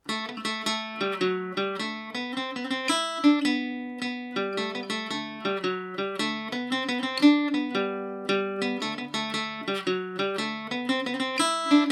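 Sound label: clog revisited G major, mandolin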